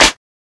perfect acoustic snare sound d key, Royality free snare tuned to the D note.